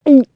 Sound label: gulp